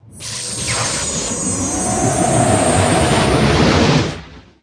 ghosts begin; egyptesc

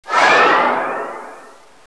force speed start

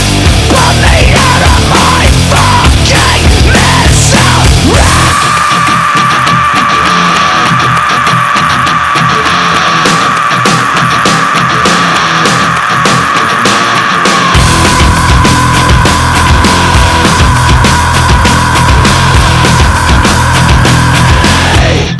victory dance; nitro

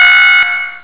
alarm

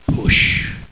push

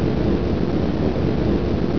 gate

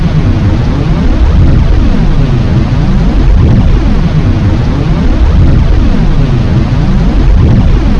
warp; nitro

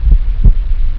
heartbeat

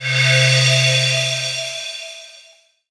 item crystal; egyptesc

